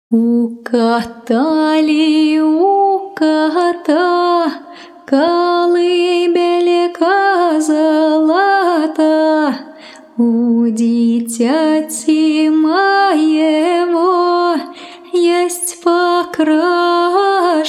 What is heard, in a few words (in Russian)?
Русские народные колыбельные, У кота ли у кота